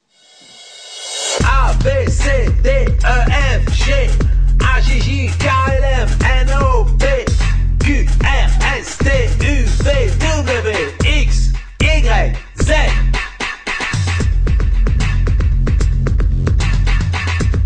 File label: French Alphabet RAP; listening practice